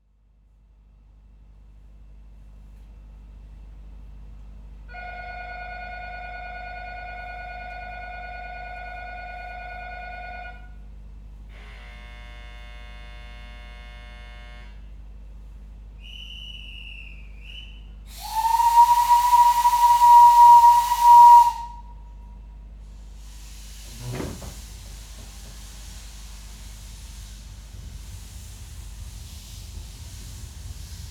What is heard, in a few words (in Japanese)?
観光汽車車内走行音ASMR。車内放送ありとなしで、二周; わらべ汽車; 汽子